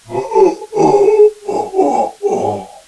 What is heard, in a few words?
pain